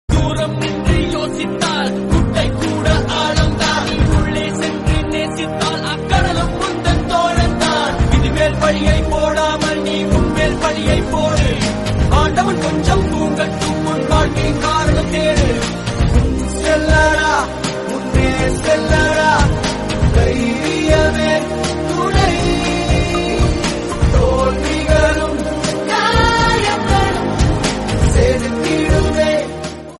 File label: Tamil Song; Manithan